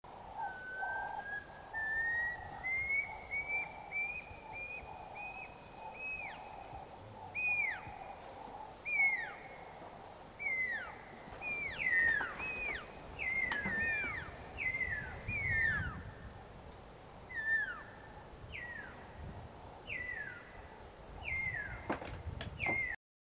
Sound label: Pearl spotted owlet